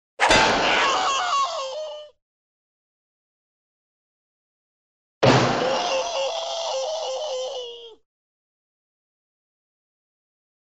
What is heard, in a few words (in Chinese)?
被击中痛苦的大喊; 综艺类音效